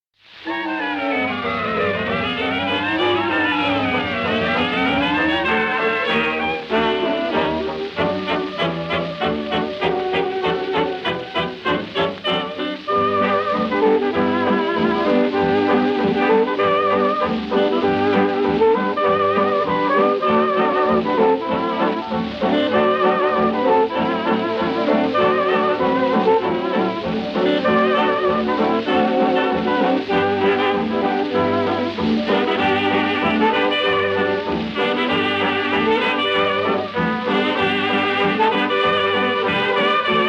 Instrumental version

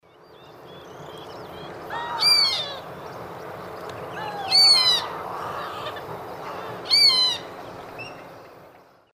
Porphyrula martinica, calls; S. America; Eastern Lowlands

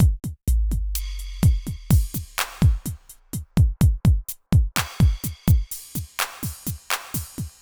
r and b

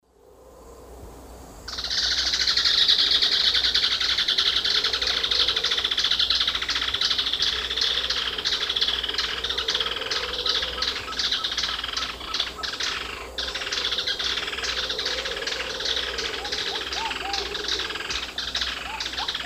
Thripophaga fusciceps, group song; S. America; Eastern Lowlands

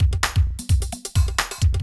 electro kit 130

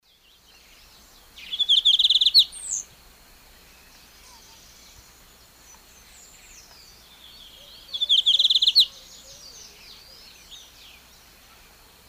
Sporophila lineola, song immature male; S. America; Eastern Lowlands

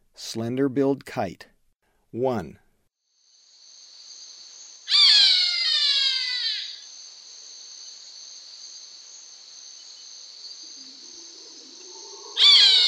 Slender-billed Kite; S. America; Eastern Lowlands

